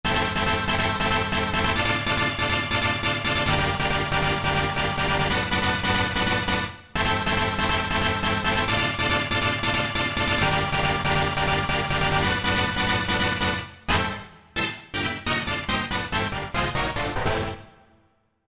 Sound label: Euro Stab, Korg TR-Rack